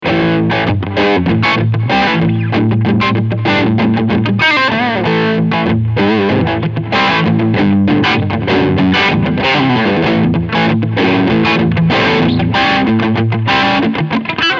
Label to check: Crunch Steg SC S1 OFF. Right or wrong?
Right